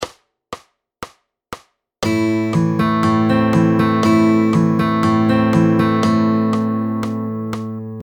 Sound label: Fingerstyle Picking Pattern; Fingerstyle Fun; P3 Supercharging a Picking Pattern